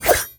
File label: Kunai Whoosh; Weapons